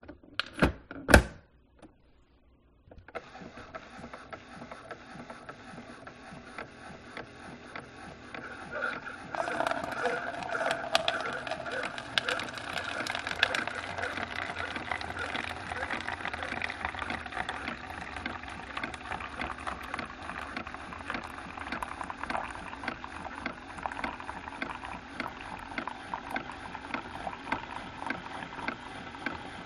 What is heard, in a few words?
small industrial coffee maker brewing coffee; omar alvarado